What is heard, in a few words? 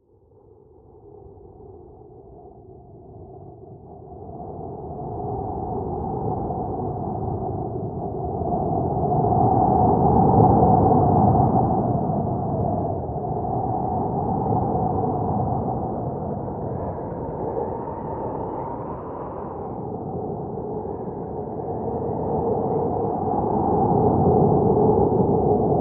loud atmospheric